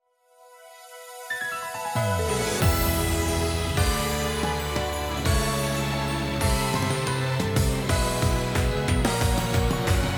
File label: inst